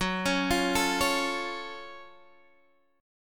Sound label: Gb Minor Major 7th, Guitar, Standard; arpeggiated; x,9,11,10,10,9; JGuitar